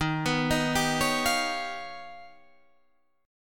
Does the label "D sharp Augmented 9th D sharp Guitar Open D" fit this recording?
Yes